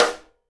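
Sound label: SIDE STICK, Live Snares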